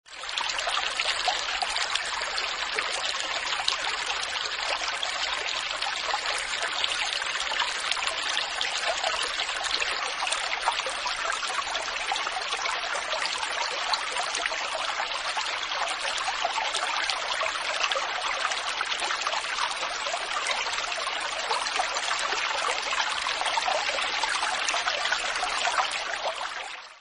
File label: water ringtone